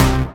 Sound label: Synth Bass; Vengeance Samples; VEE Synth Shots